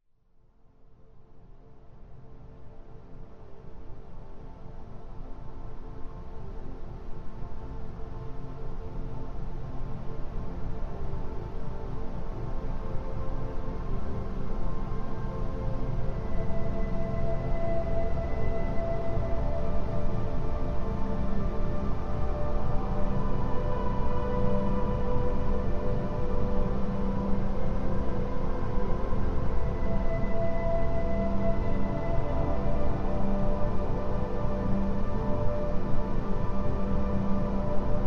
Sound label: meditation monaural